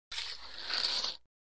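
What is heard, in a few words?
Poker Chip Movement; casino games; chip mvmt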